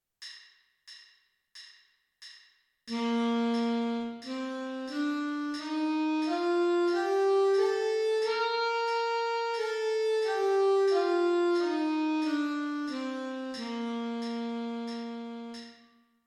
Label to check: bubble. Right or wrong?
Wrong